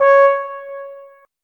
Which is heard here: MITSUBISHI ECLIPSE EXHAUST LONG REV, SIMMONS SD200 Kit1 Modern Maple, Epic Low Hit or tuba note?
tuba note